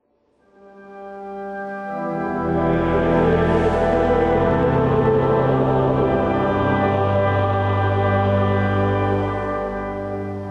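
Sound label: Gospel Responses and Improvisation, organ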